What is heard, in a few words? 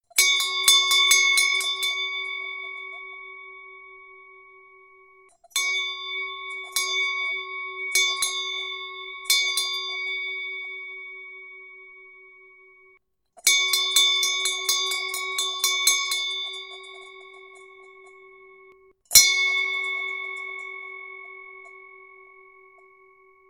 bell ringing